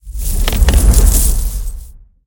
Lock it Pre Shake; narcos

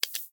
button Down; butterflystaxx

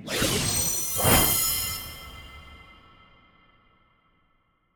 random Mystery Multiplied Symbol Animation Start; conan

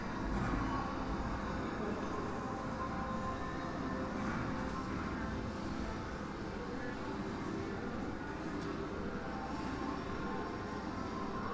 ambience general; spinsane

